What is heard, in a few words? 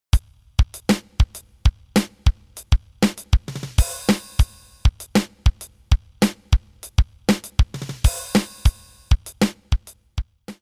drums